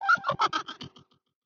smile laugh

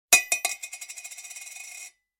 coin droping; ringtones; sms

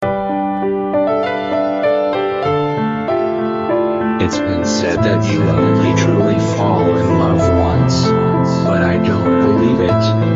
new piano

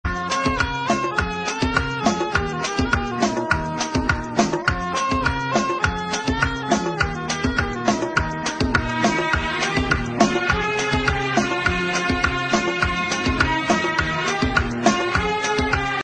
instrument

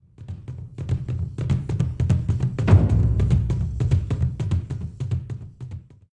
Drums

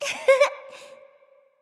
Laugh

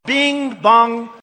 Bing Bong Alert

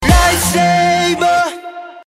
Lightsaber

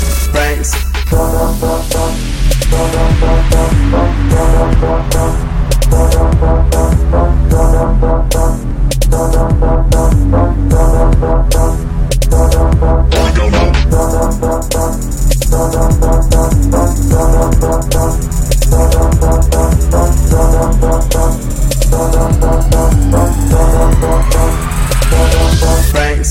BASS

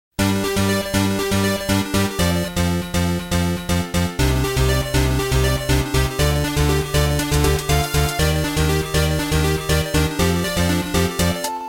objection song

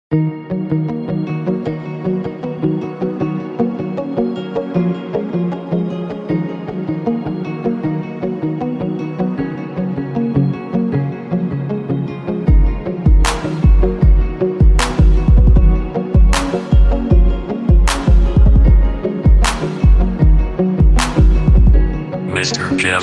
Amazing Alarm Music

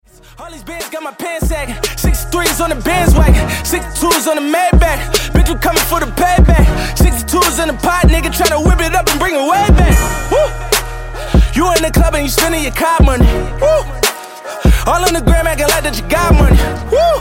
Trap Vibes

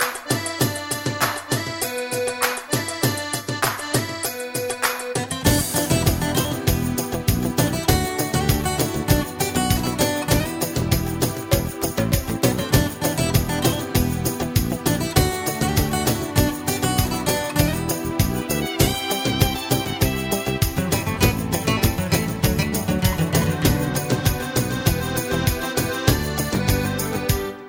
wind, ringtones

